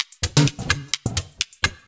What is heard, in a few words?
Electro Loop 128BPM; Vengeance Samples